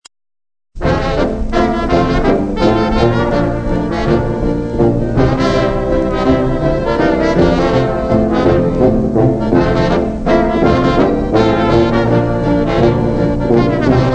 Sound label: waltz; 78s 1958 practice session